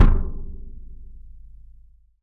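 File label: RESONATING KICK; 3D Drums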